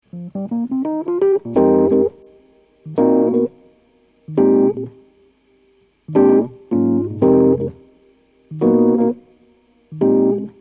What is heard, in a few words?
Hip Hop Keys